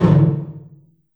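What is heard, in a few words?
large room 909, reverb; TR 909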